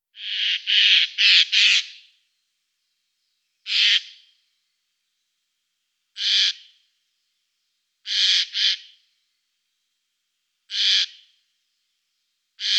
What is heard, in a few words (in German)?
eichelhaeher garrulus glandarius, tierstimmen; wildtiere